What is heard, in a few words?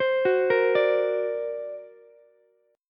D Augmented 7th Ukulele, chord; D+7 arpeggiated; JGuitar